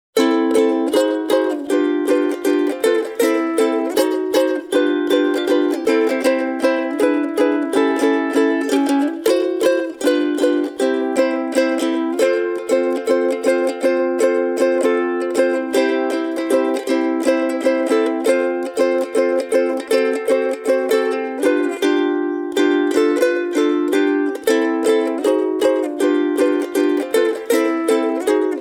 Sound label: Sigma SUM 2S solo